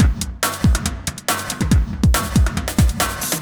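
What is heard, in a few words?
industrial 140 kit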